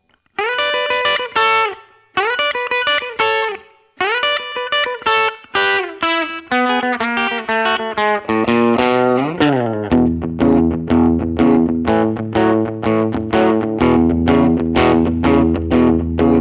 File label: Hot Rails clean; SDS